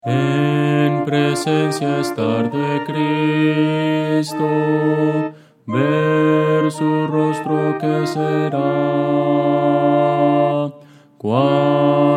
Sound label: contralto